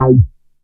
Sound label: C3, KORG DW8000, Vintage Keyboards; Big Fish Audio - First Call Vintage Keyboards